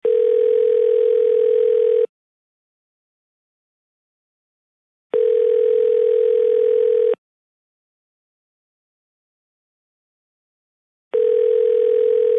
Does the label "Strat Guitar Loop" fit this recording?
No